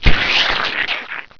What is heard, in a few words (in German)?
schleim; hurrican